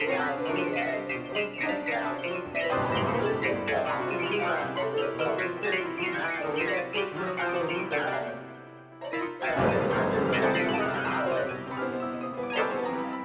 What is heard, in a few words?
piano